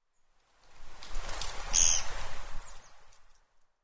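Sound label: Lesser Spotted Woodpecker; nakashibetsu; koe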